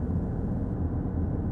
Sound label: room; Mafie 1